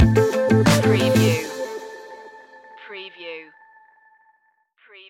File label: analog epic stinger